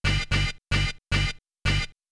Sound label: screechy horn